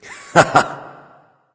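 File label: non-verbal heh heh; smite; voicepack; houyi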